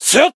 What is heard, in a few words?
attack, jp